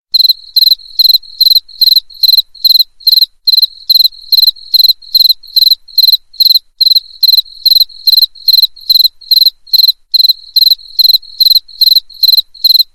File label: Cricket Bug Chrrrrrr